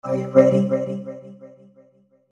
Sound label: start